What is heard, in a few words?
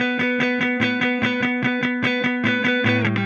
Silos Clean Strum Guitar, Indie Rock